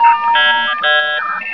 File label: message; svencoop